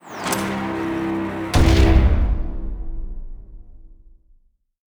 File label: Lose Short